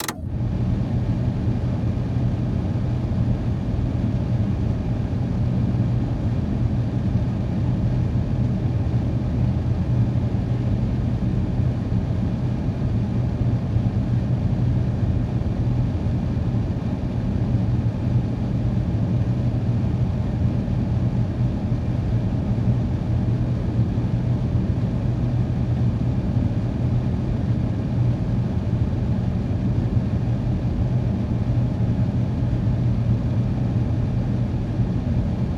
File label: Vent Full Size Van Air Conditioner Vent Third Notch; transportation